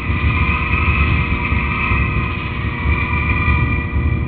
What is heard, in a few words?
saucer; ParadroidII